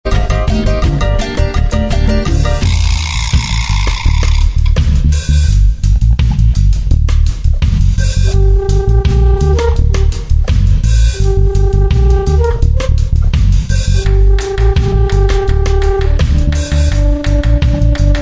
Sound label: Cumbia No Frills